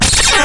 squeal

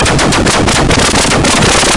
crazy

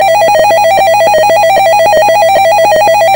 freaky

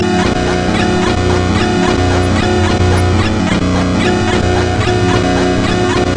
melodic drone

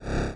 pull flinger

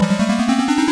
ball hits hole, golf

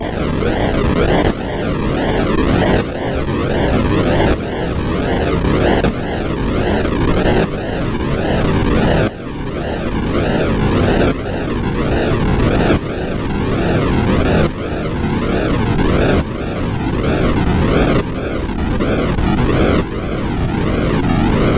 bangy drone

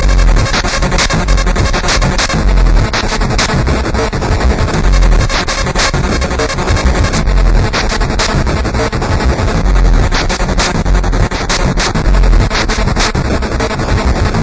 angry

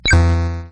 title appears

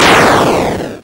crash

